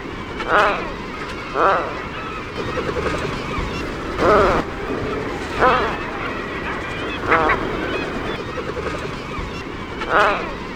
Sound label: atlantic puffin